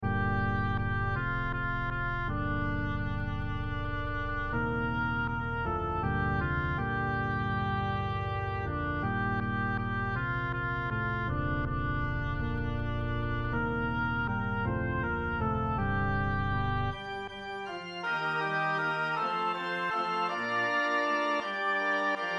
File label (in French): donne moi seulement de t'aimer ténors